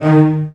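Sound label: CELLOS F4, Strings; ILIO; Synclavier Strings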